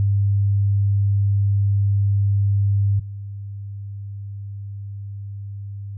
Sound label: soundproof effect 0100hz; 遮音(防音)シートの防音性能; 遮蔽前：3秒 ⇒ 遮蔽後：3秒; 遮音シートで遮った場合の防音性能です